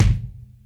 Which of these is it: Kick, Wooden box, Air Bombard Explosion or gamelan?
Kick